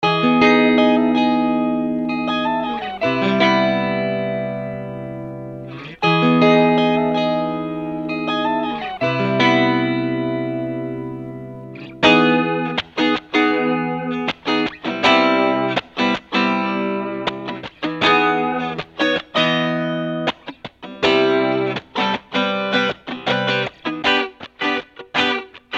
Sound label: Guitar Bits n Bobs